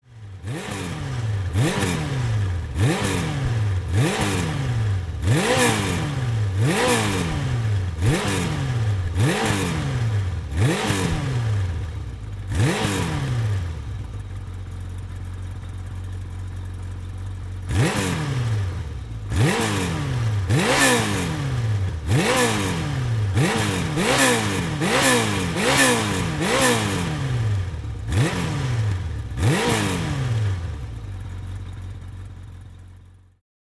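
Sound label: Motorcycle, Kawasaki 750, Idle Close Up, Rev, Hard Revs, Off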